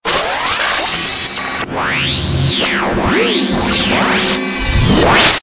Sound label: Stinger; STINGERS